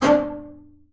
D4, BASS SPICC; Xsample VOL-5

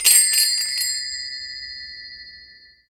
crotals; Cymbals

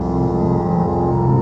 HISS ORGAN; ENVIRN-AMB

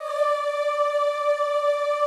D4-L, Classical Choir; Best Service; Extended Classical Choir

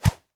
swing, handy percussion

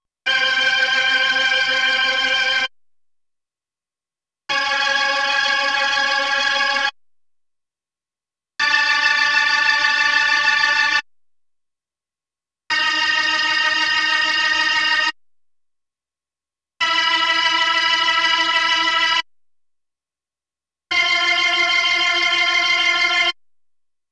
B3 Organ; Masterbits CLIMAX 8

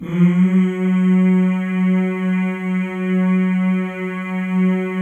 male; Spectrasonics; Symphony of Voices

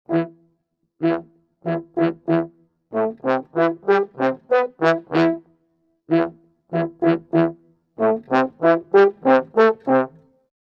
95 e min brasses, Orchestral arrangements

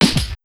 ROCK 132, Big Beat Drumloops

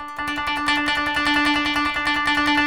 KOTO TREM

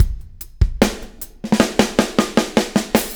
REV, REVEREND; Spectrasonics; Backbeat

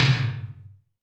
Tom Lo Return, Alesis D4

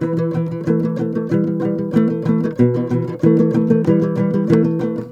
TOPSHUR; Soundscan vol.60; Asian Traditions; AKAI

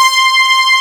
BRASS C5; Big Fish Audio; Synth City

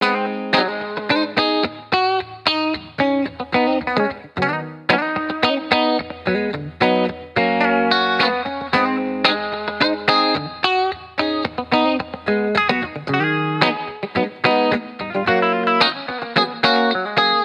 Guitar Bpm110 Key G# Full, 70s Guitars Full Mixes; Fox Samples; Back to the 70s Guitars

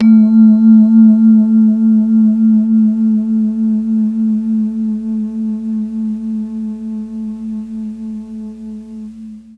A1 VIBES, Mellotron MKII; Mike Pinder - Mellotron Archive CD

